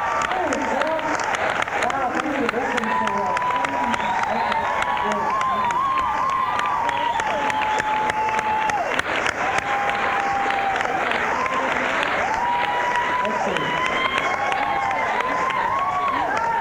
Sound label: crowd; adelaide, australia